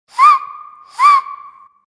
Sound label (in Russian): zvuk svista